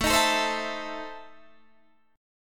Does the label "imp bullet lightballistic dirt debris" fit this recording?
No